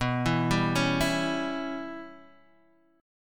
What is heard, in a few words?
Db Diminished B, chord; Dbdim/B arpeggiated; JGuitar